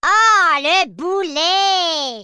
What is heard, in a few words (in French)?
boulet